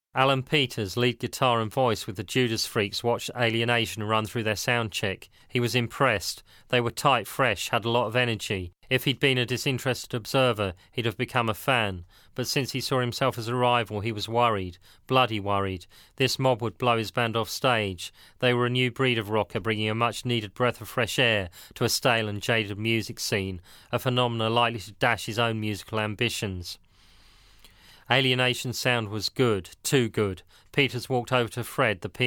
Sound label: Lead Guitar And Voice; Stewart Home